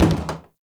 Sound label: Plastic Hit Short; Warframe; Ambience; Nineteen Ninety Nine